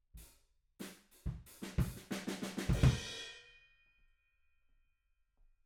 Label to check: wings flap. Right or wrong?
Wrong